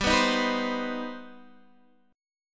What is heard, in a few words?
C Minor Major 7th, G, chord